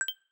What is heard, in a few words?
vox enable; TeamTalk v5.15 ubuntu22; client sounds Majorly-G